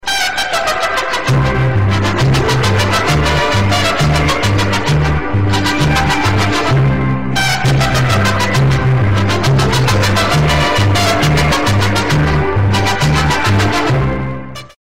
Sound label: mexican earrape